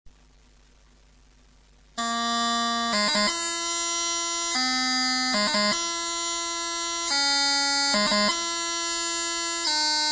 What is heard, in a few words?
grips, bagpipes